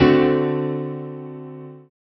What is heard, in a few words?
chord